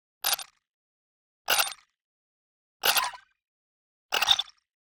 Generic Click Digital

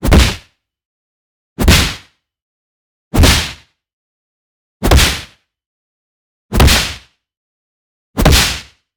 PUNCH Spacious White Noise; boom library; Anime Essentials

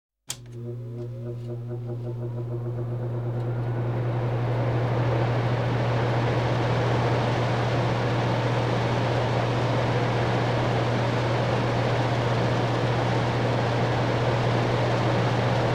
Air Conditioning; KMP Film Video Sound Effects

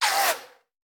Bullet Pass By; Sound Ideas; Guns

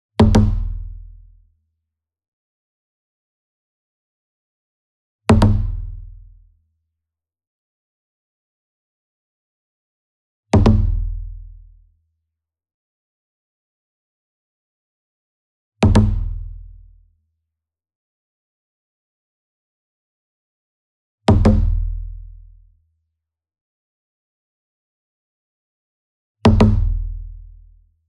SINGLE DRUM Kodo Double Soft Beater Near; boom library; Cinematic Strikes Construction Kit

